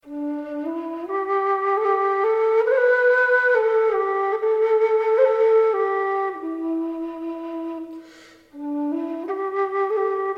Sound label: low d whistle